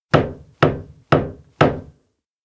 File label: hammer wood; anemoi